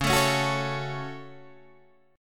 C sharp Suspended 4th Sharp 5th C sharp Guitar Open D, chord